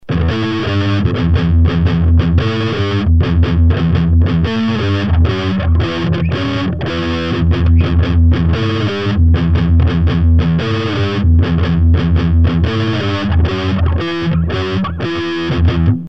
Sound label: CUBE-30 Metall; Roland